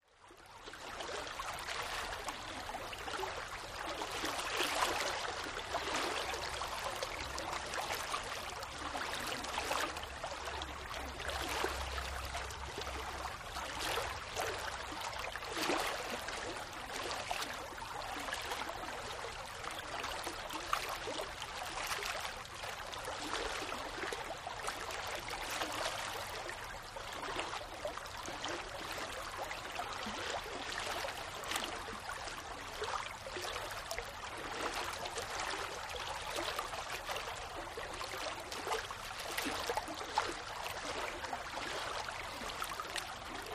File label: Water Flowing Slowly, rivers